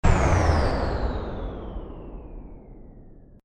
Power down, Power Down Of Scifi Electronic Device Or Machine; Control Panels; Control Panel Sound Effects; Control Panels (Sound Effects:Science Fiction); Stuart Duffield